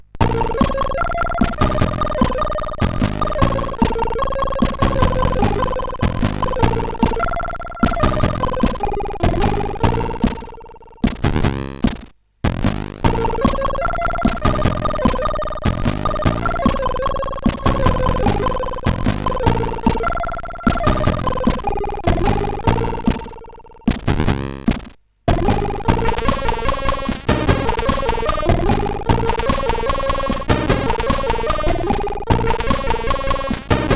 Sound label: Intro CSG8580R5; sid